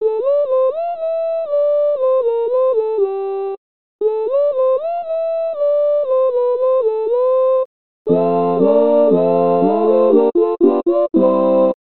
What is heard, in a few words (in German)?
Zigeunerlieder tutti